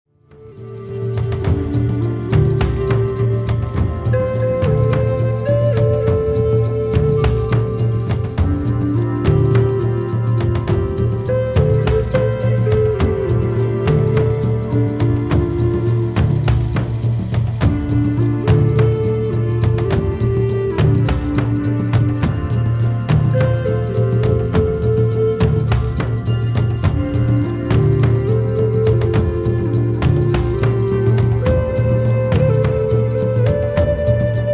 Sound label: Drum